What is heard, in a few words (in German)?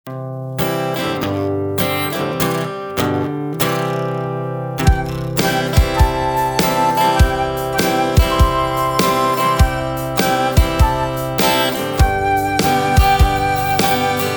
mit Floete; floetenschule